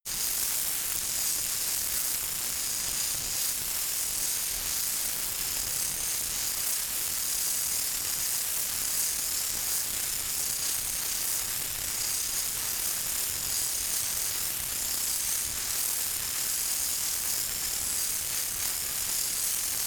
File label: insect shaker constant